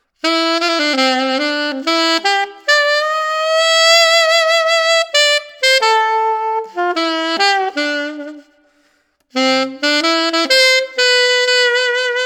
BetterSax Burnin Reverb, metal alto saxophone mouthpiece